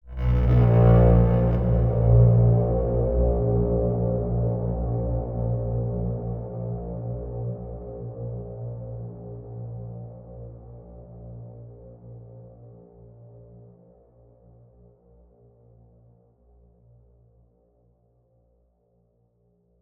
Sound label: Braam Dystopian Bb; DSGNBram; MatiasMacSD; Seclusion